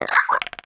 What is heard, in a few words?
idle chirp, headcrab, creatures